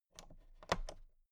toolbox latch open soft

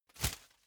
whoosh paper scrap flutter; swooshes-swishes

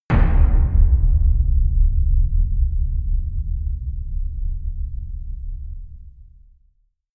dark cavern hit; hits explosionen

